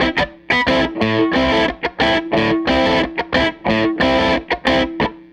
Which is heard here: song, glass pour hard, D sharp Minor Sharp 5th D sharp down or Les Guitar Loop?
Les Guitar Loop